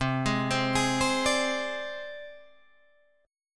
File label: C Suspended 2nd Sharp 5th C down Guitar Drop D